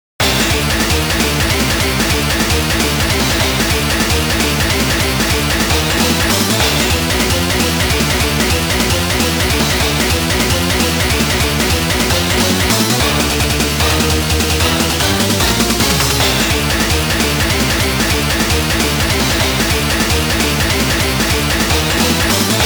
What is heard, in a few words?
Scream Out; Eschatos